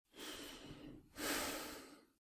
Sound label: Breathe Half breathless; Eden; RadwinIsland